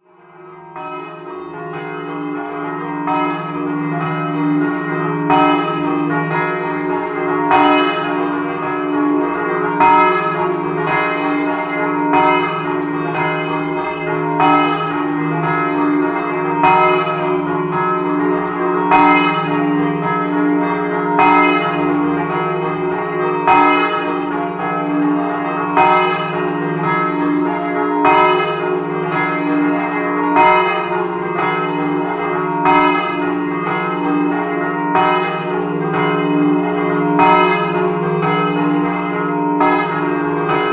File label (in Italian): INTERNEPPO, campane